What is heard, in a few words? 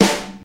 high quality original snare sample g key, acoustic snare sound